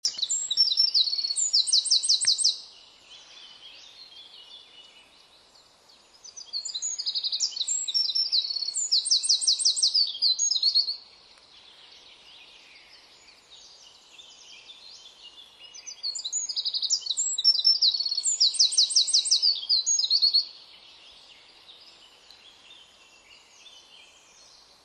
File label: Troglodytes troglodytes; SETE